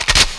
lock, weapons; dmc

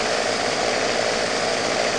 chainsaw running idle; svencoop

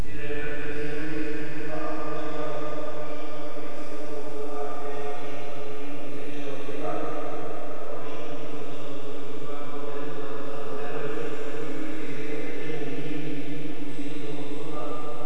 chor loop

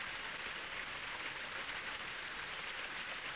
waterfall low; dod

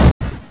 crash; svencoop

